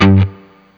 BLU PICK, Guitar & Bass; 90 s Sample CDs; Quantum Leap - Guitar & Bass

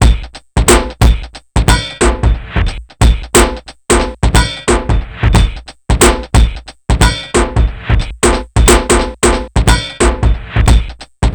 hiphop; INIS; Funky Xtreams

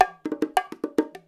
FILL, Percussion

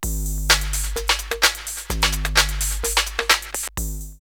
Uzi Beat 128, drumloops, Rave; X-Static Goldmine; 90 s Sample CDs

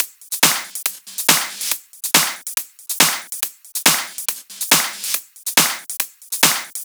drum Loop 140BPM basic; Vengeance - Essential Clubsounds Vol.5; VEC5 Drumloops; VEC5 Multi Loops 140BPM

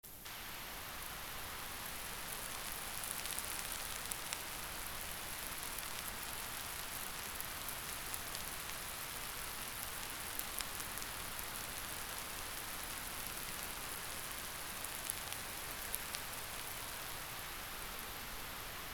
tis not a song but rain and stuff

